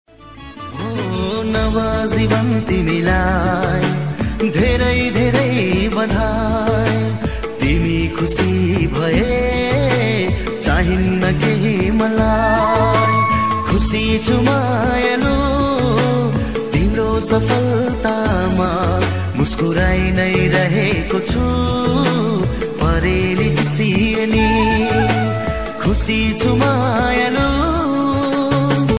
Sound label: sad nepali ringtone